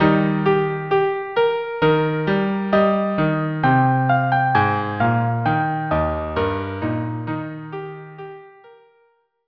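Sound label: violin cello; larmament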